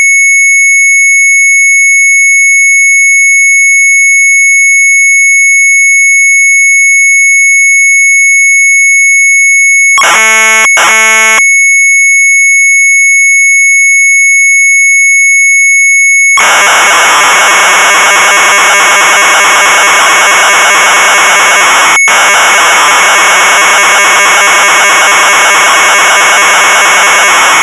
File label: Sharp MZ800 Tape